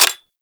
Fire Stop Mech, Weapons; Warframe; Tenno; Protea; Velox Prime; SMG

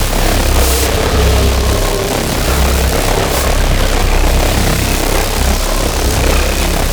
Beam Loop; Ultimate Abilities; Zenurik; Warframe; Focus

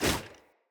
Bow Melee Whoosh; Weapons; Player; Bows; Grand Father Bow

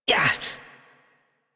Pain Short Operator Voice, en; Warframe; New War

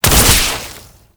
Web Tangle Shot; Boss; Enemies; Abilities; Warframe; Oraxia

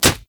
Melee Fist Impact Generic; Fists

